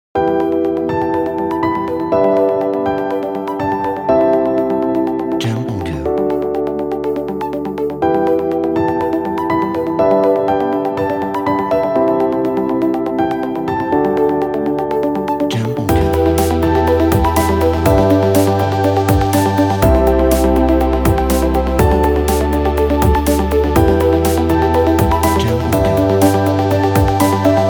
Corporate Opener

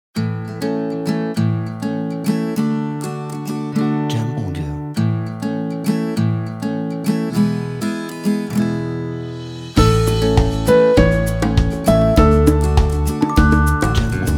Positive Happy Uplifting

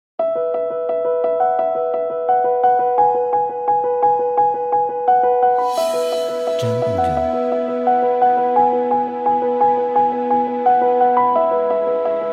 Positive Inspiring Piano

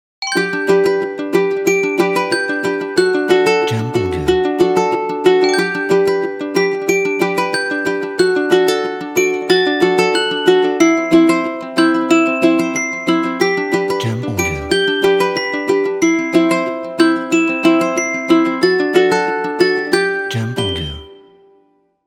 Upbeat Ukulele Bells

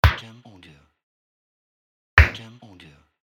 Impact Kick

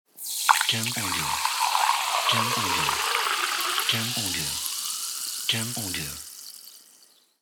Sparkling Water

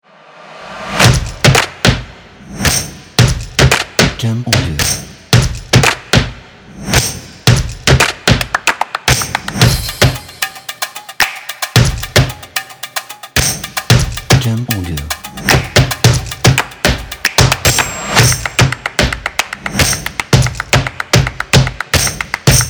Stomp Percussive Energetic

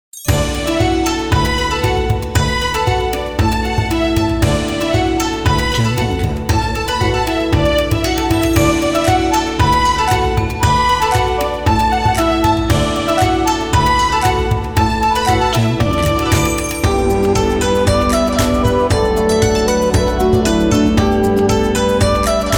Asian Music China

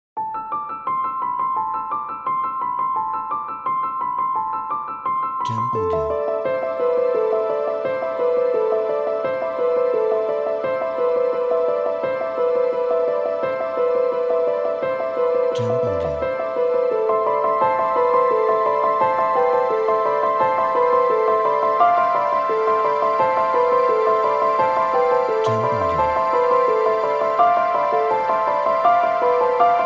Dramatic Sad Piano

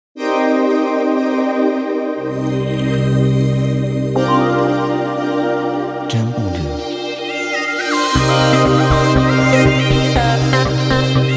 Phonk Urban

